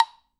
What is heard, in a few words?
AGOGO BLOCK LO, PERCUSSION; Sonic Reality; Interactive Drum Kits